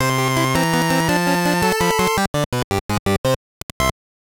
Level Clear Jingle; Wario Land II

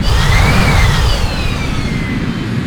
Jetcar Rev

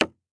Sound GUI Button Common

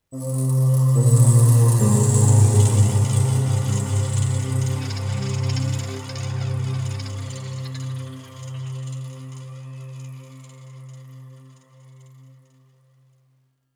Oneshot Synth; Zombie Army 4 Dead War

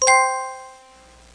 Pickup

